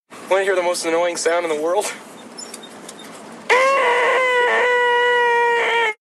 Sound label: the most annoying sound